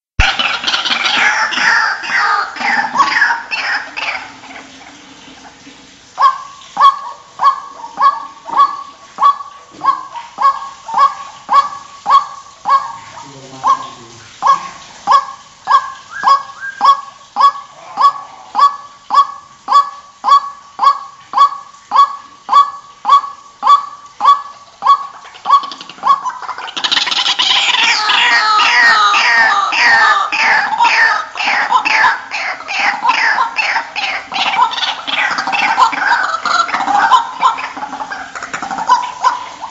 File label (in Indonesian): suara ruak ruak